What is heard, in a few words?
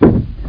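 wall hit; rott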